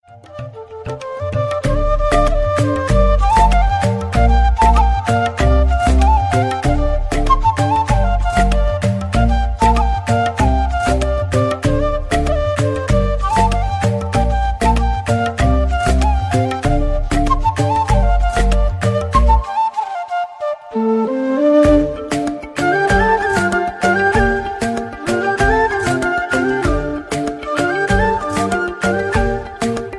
Indian Flute

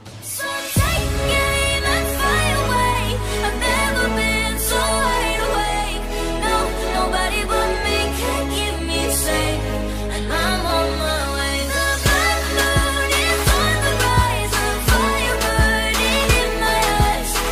English Song Ringtone

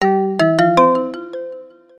Notification Ringtone; Huawei MatePad Pro 13.2

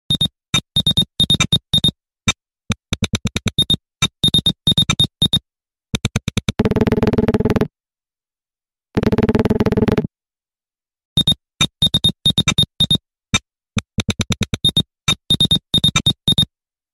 Nothing Phone 2 Ringtone

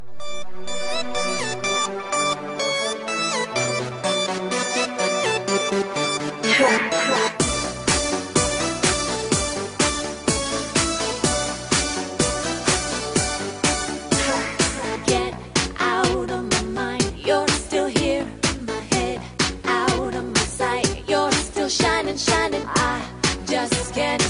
samsung Wonderful Ringtone

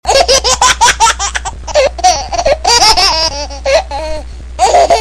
Baby Laugh Ringtone

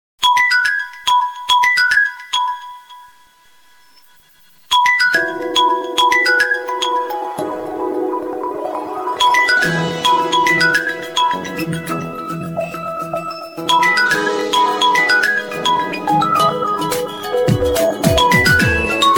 Vivo Ringtone

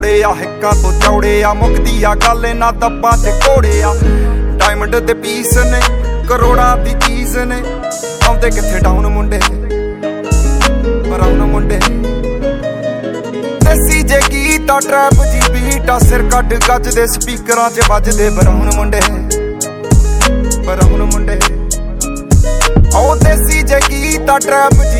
Bollywood Hindi

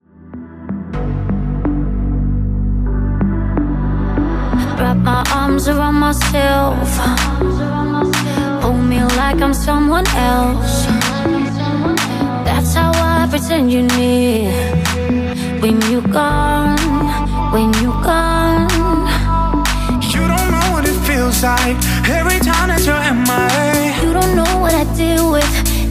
English Songs For Phone Ringtone

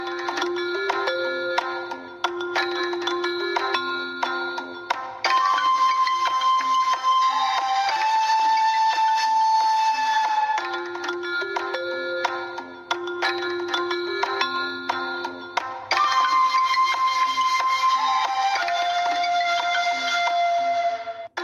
Huawei Eastern Original Ringtone

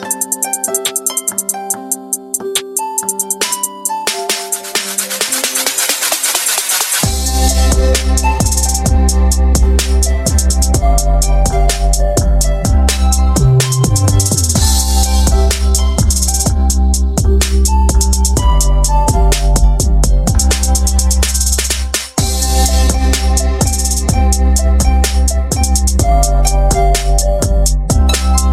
Samsung Alarm Ringtone Trap Remix; Morning Flower

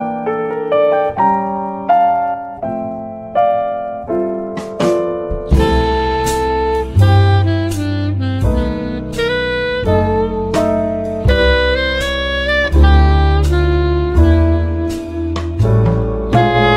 Stock Ringtone; Samsung Galaxy J2 Core

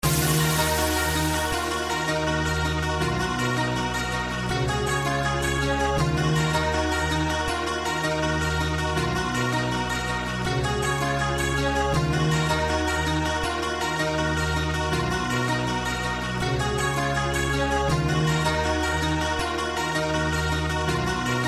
Samsung Galaxy Y Official Ringtone

